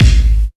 Gated Kick; 300 Drum Machines; E-MU MoPhatt

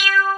TRANS X BS; Pro-Rec - Synthsonic; TRINITY

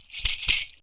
perc fill; Univers Sons - Raricussions

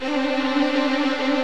VIO MAJ TRIL

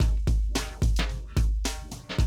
Classic DrumLoops, 110

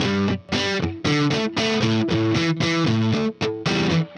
Riff Guitar, Indie Rock

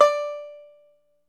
ARCHTOP, Arch Top Banjo

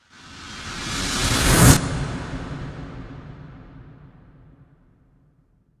impact rush; M-Audio Pro Sessions; Liquid Cinema

